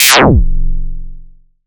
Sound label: ZAP, ZAPS THUMPS; Best Service Alpha Dance vol.2